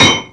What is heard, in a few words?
Repair Broken Brakes